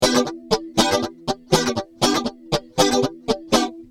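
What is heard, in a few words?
rhythm guitar, chorus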